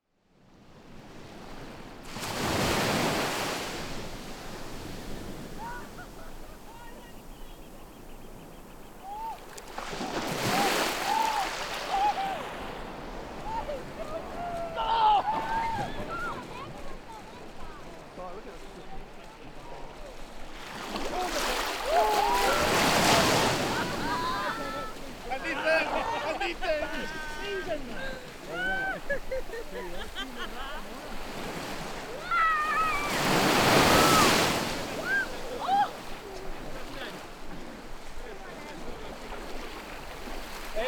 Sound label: family playing on cold beach; Scottish; Slice of Life